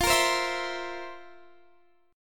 F Major 7th Suspended 4th

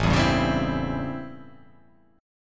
A 7th Sharp 9th; A7#9; strummed; JGuitar

